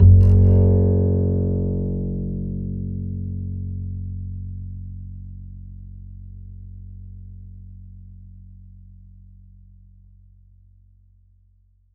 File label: E, Upright Acoustic Bass; 1ST POSITION; Larry Seyer